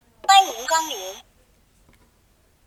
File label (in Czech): melodie